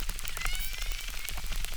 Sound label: Chirp, acquarium